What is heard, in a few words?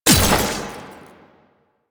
Ammo Cast Start; Warframe; Warframes; Frumentarius